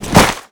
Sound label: c4 drop dirt; weapons; c4 detpack